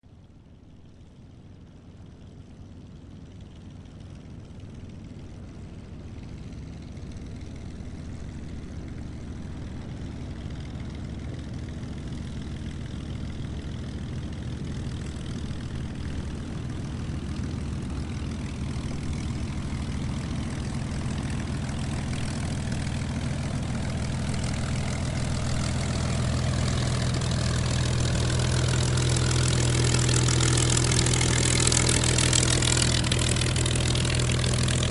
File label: P-51 taxiing